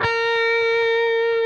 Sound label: PRS lead, guitars; Spectrasonics; Hans Zimmer Guitars VOL-1